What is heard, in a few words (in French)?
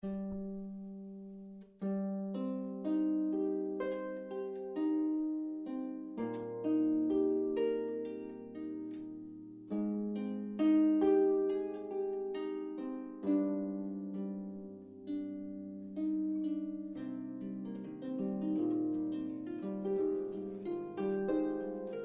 34 cordes chene